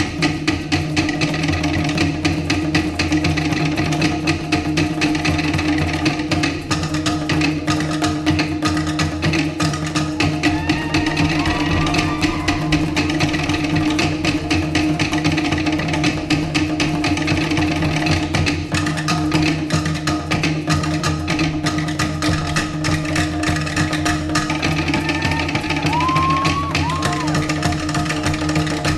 Polynesian

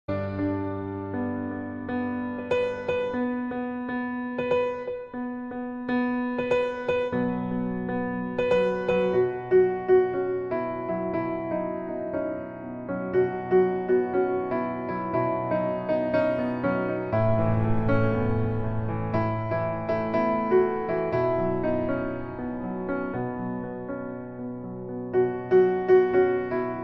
piano

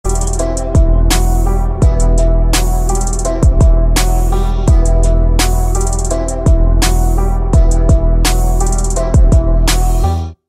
Beat

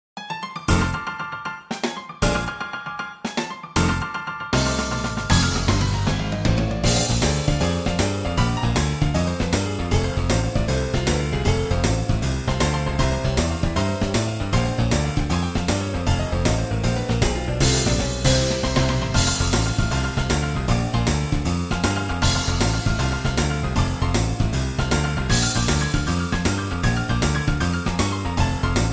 rocking tune

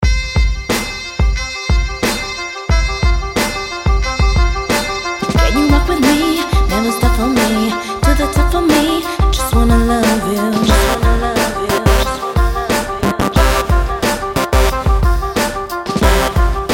love hop, hip hop ringtone

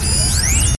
Focus Scan

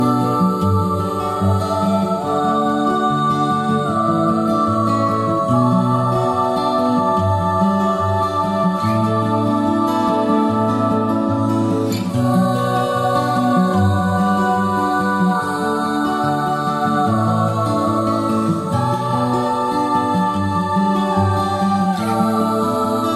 guitar chorus; alternative

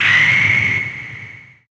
homerun bat; ssb4

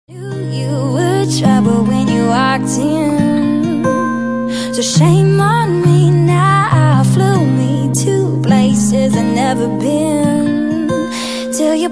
acoustic

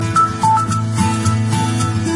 Bell bout guitar

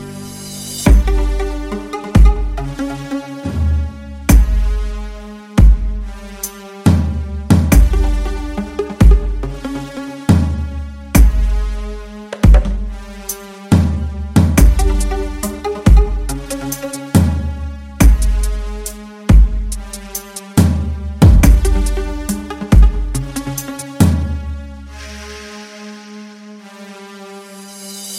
Bass

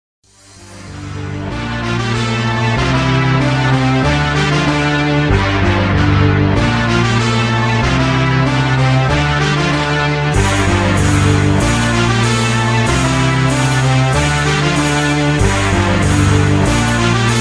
hard newschool hip hop rap instrumental

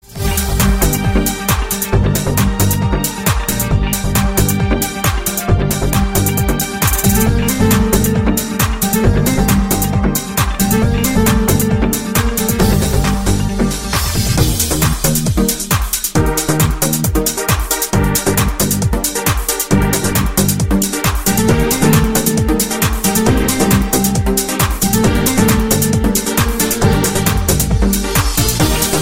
Beach Music

